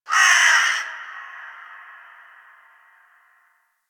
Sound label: Forest Creature Vulture; Ambience; Warframe; Grineer Forest